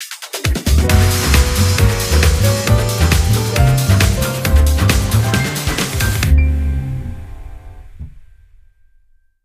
Level up; Gran Turismo 6

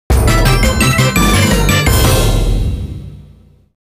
Win; Taiko no Tatsujin Doka to Oomori Nanadaime; PS2

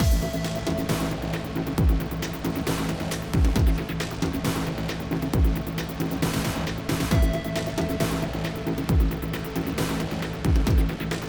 Combat 8CH 135BPM; Rezoning Act2

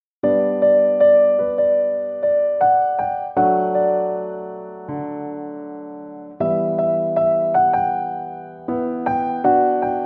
Piano Solo; Supreme Candy Soundtrack CD 2009

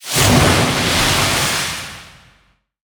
Monster Cutin Water; Se Monster Cutin Water

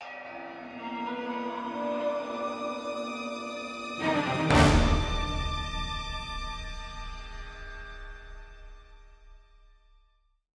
Spooky Ship No Drum End tag

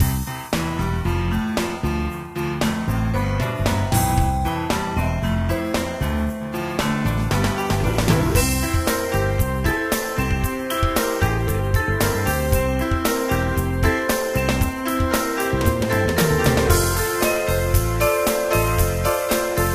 Main menu music; Diner Dash Hometown Hero

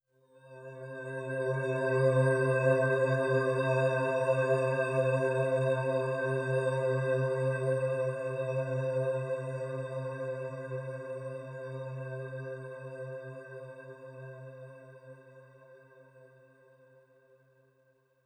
Overlay Drone

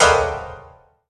break piano keys; stranglehold

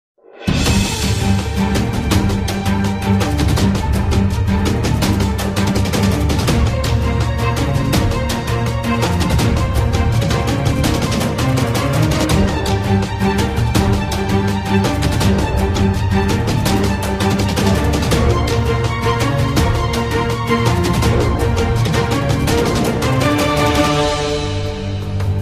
Action Hero; Jingle Punks

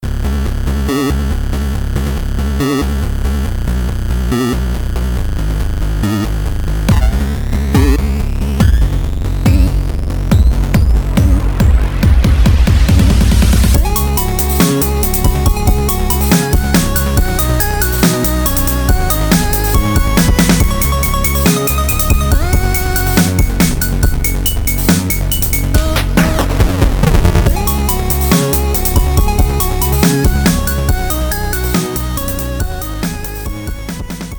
Chiptune Does Dubstep; Super Destronaut 2 Go Duck Yourself; Wii U

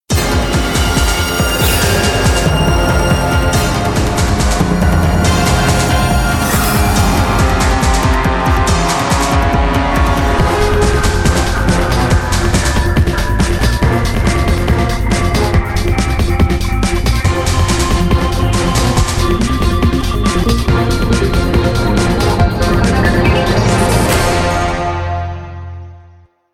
Action Short; Emergency 3 Mission Life

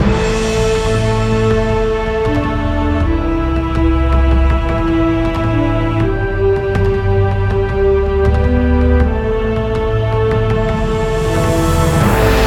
TENSION LOOPABLE

